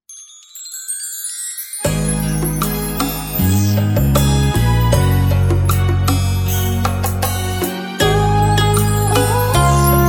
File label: Lk beat, playback